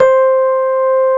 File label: SUIT F4 C4, suitcase; Jazzistic